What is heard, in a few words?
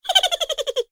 cartoon silly high pitched laugh